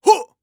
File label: warrior attack swing